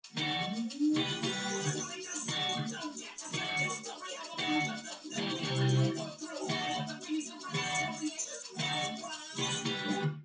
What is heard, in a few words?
wdrums; SiSEC10; underdetermined